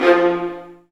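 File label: Marcato, Strings; Vlas Marcato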